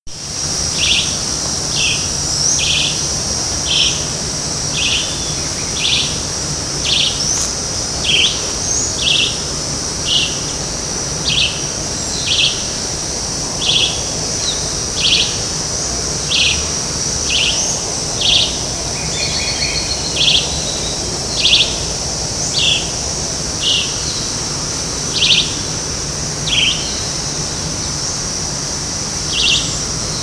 Vireo olivaceus; I Butantan; SP; Silveira

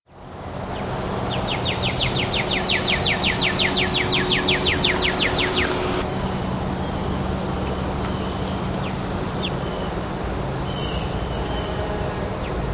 Cardinalis cardinalis song; Greynolds PK; MIA; US

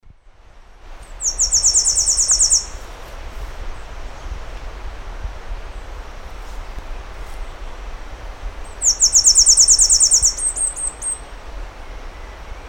Sporophila falcirostris; F Ang; Ubatuba; Silveira

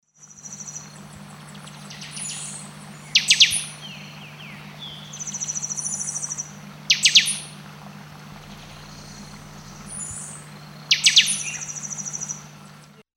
Sporophila frontalis; Tapirai; Silveira

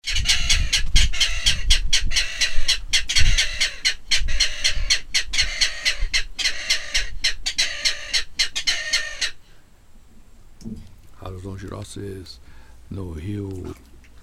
Rallus longirostris; r Salsa; Silveira

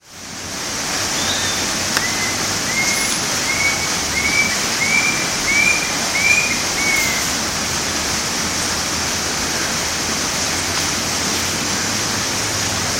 Attila rufus; Pco Anta Mongagua SP

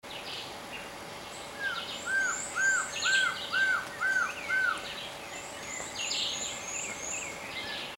Trogon rufus; N Cunha

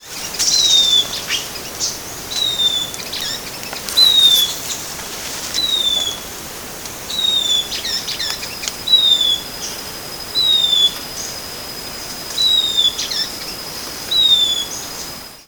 Myrmotherula unicolor; Bertioga; SP; Brazil; Silveira

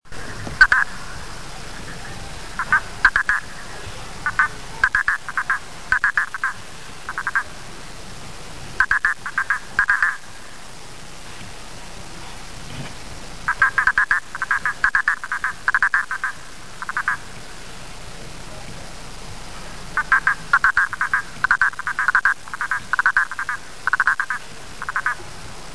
Hypsiboas prasinus; Monte Verde MG; Brasil; A Silveira

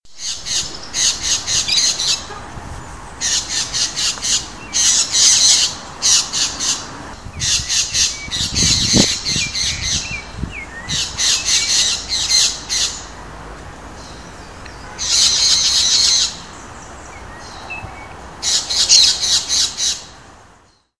Brotogeris tirica; Pq Ibirapuera; SP; Silveira

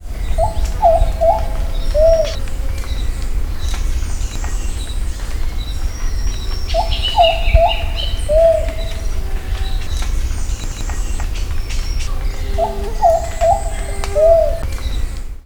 Patagioenas plumbea; Jaguareg; Bertioga; Silveira

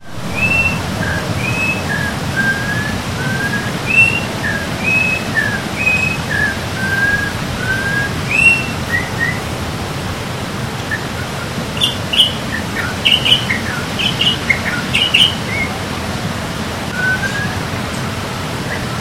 Icterus jamacaii; Comandatuba Island; Brazil; Silveira

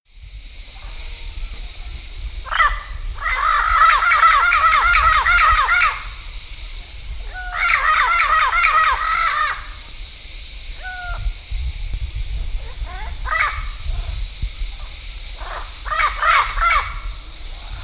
Amazona aestiva; Silveira

